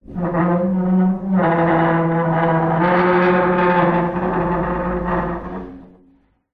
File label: foley metal scrape large heavy; zapsplat